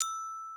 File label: gloken